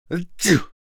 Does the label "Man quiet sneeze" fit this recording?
Yes